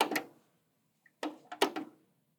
VHS buttons; SoundsCrate

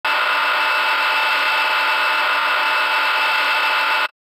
robotic arm long; soundscrate

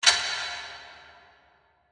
designer hi metal hit; SoundsCrate

